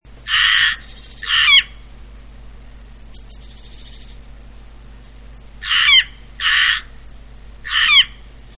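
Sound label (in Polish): gawron, krukowate - corvidae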